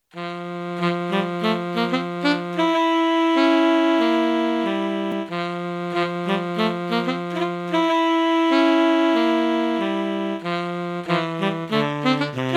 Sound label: 2 T Sax; SAXOPHONE; Sax Quartets